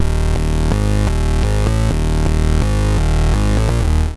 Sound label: PULSRUN 126, BASSLINES